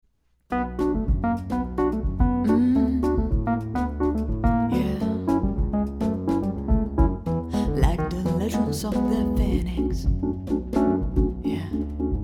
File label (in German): Loungeband Live Piano Drums Gesang Band; ModernJukes; Hannover; Hochzeit